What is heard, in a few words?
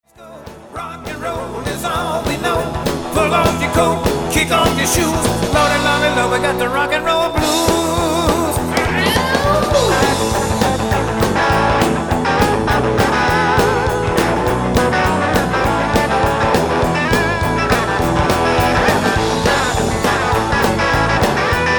ROCK N ROLL BLUES; memphis + muscle shoals